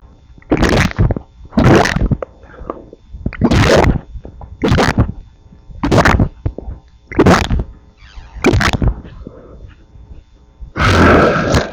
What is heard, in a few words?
water, soundscape; radio work